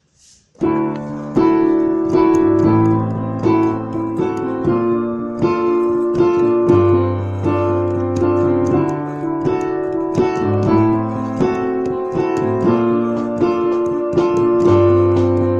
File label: piano Theme Cold